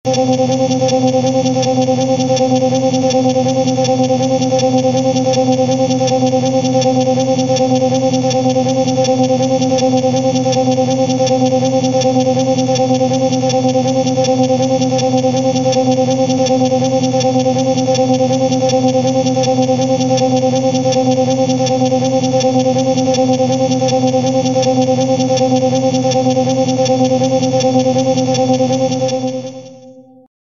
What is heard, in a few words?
decontamination field; medical devices; TOS